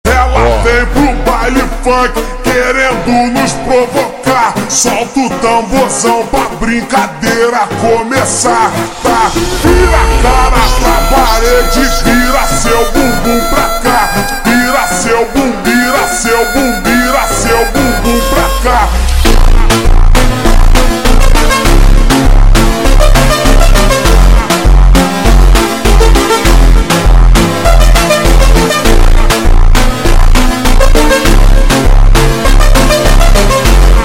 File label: Montagem Super Slowed